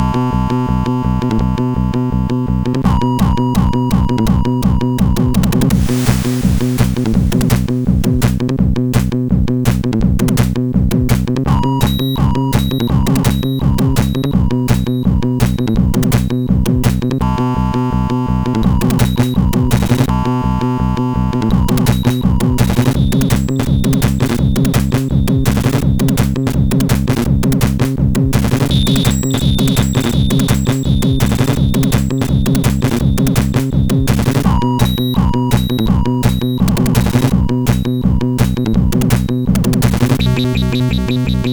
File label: Scream, sid MOS6581R4; hvsc